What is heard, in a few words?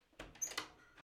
Door Handle